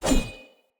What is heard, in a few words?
Short Sword Whoosh; Weapons; Swords; Sollos